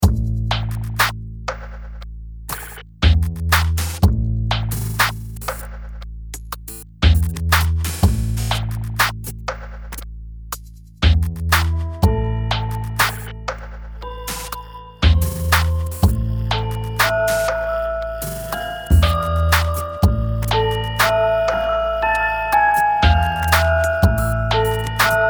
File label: instrumental